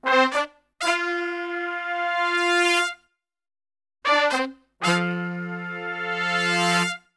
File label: SOUL F, Brass, SOUL LICK; Best Service; Brass Super Section